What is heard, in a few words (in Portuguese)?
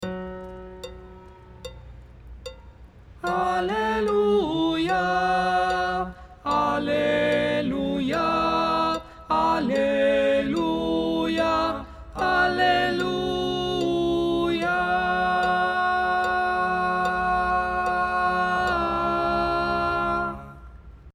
Aleluia contralto